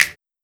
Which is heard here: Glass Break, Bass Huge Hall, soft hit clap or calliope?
soft hit clap